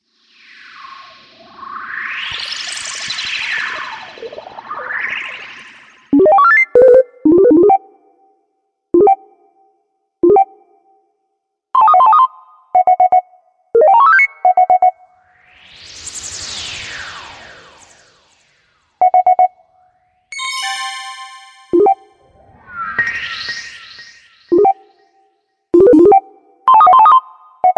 Robot Ringtone; Zarnoid The Robot

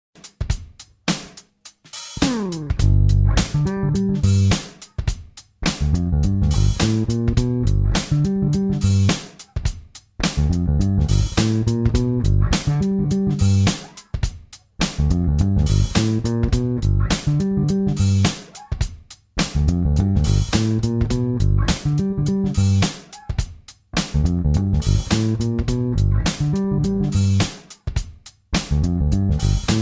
G7 16th swing groove, G7 16th swing bass groove

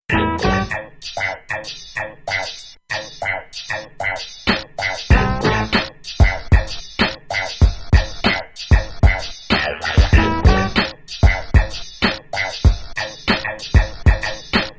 beat

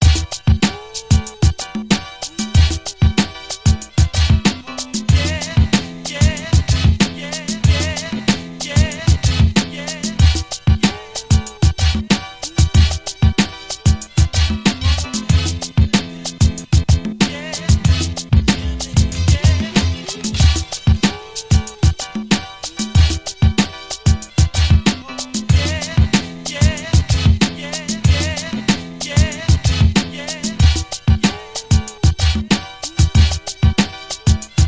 beat

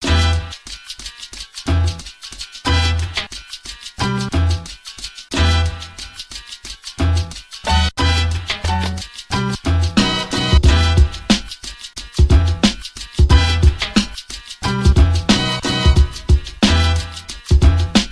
beat

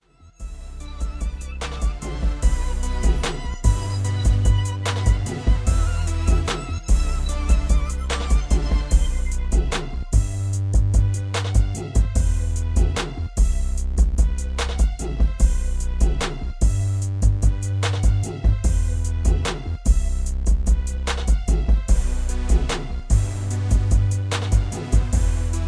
74BPM

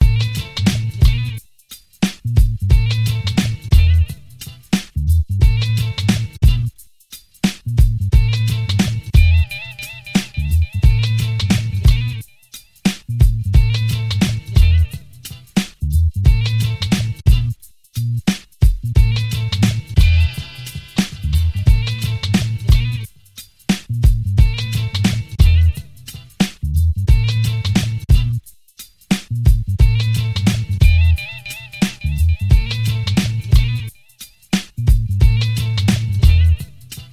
beat

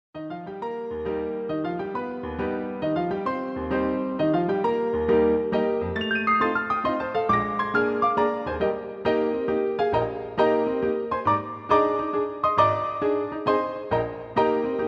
piano roll

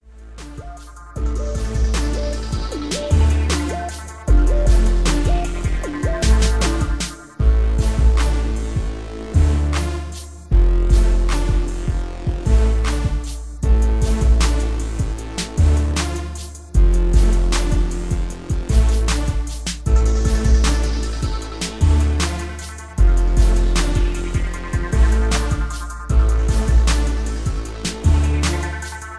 77bpm

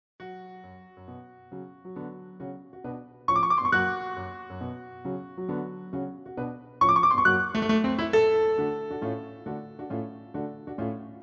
Trompette et Tambour Trumpet and Drums; Jeux d Enfants Suite No 6

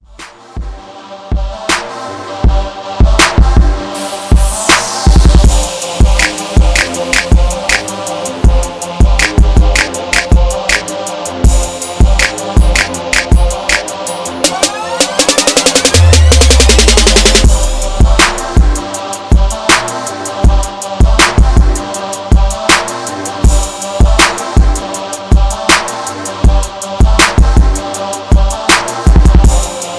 80BPM, Rap Beats

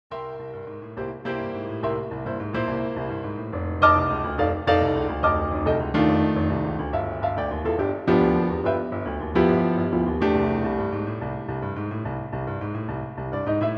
Blues

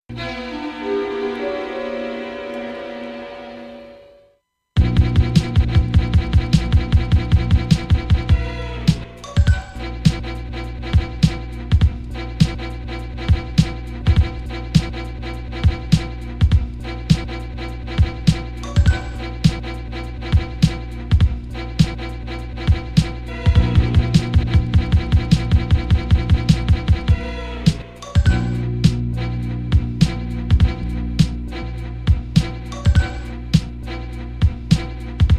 beat

